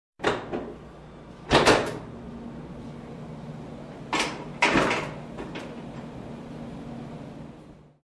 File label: Rumbling in Warehouse